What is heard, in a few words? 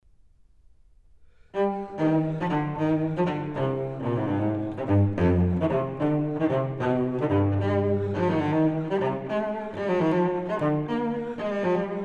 Suite for solo cello No. 5 in C m; Bach; Cello Suites